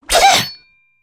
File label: Sword Swipe